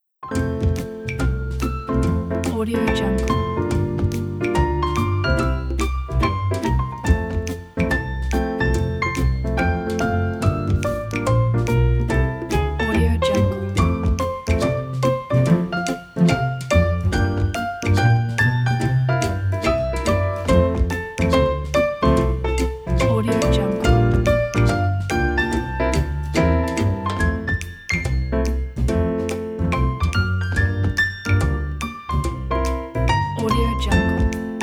jazz trio

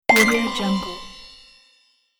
magic collect pickup

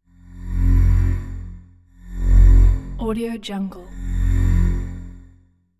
pulsing tone smooth mid range synth tone

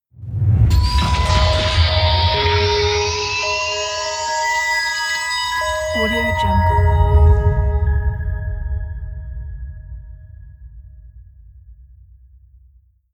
mystical secret logo; phantomatic

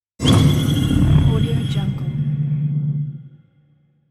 fright

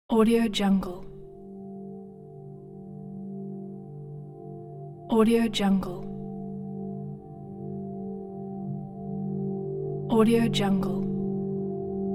forgotten times drone

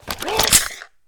bolt breech alliance assault rifle

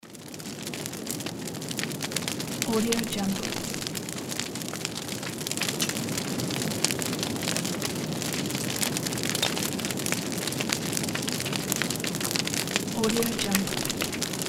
bonfire; volkovsound

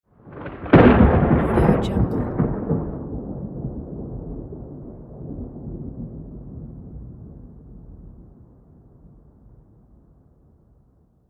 thunder hit; tineside

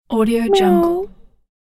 cat meow; catsoundstudio

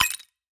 ambient game ui chirp material pick up; esmteam

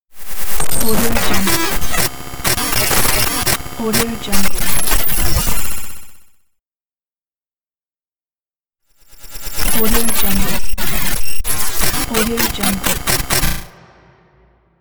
glitch sound

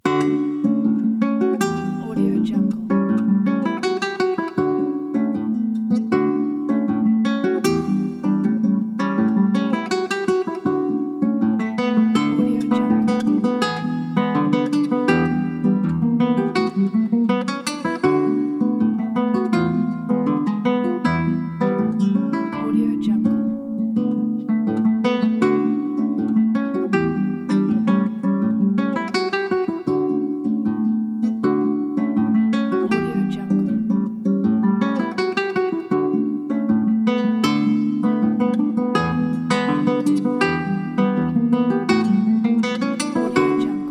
pleasant classic guitar

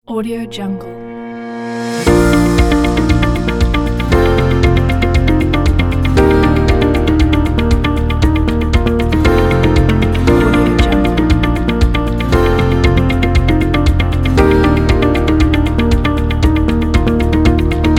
corporation logo pack

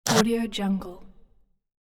motor small vibrating with buzz; hollywoodedge

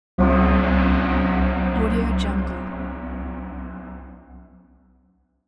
gong

